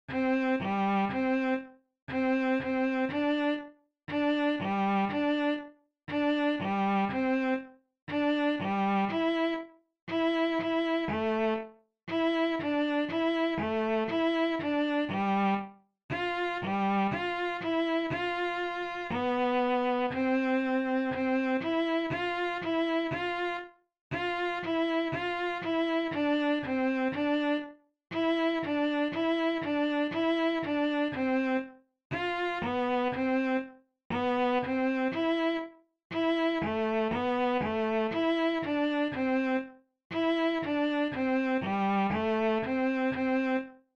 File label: Sightreading 3 4 5 Positions G D, Bass; Bass for Camp; More Positions